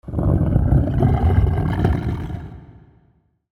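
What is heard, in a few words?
ATTACK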